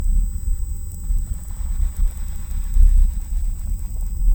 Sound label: Hydrophone